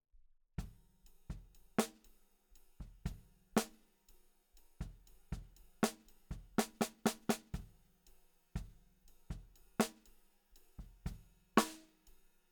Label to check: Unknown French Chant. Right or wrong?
Wrong